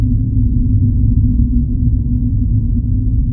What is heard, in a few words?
Interior Bake Amb; moul